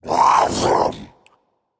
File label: grunt attack, voicepack; smite; jorm